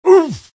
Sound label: pain sharp; spy; source engine; tf